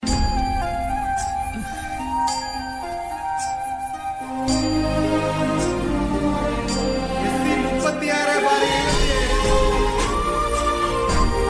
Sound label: Lovely BGM, ringtones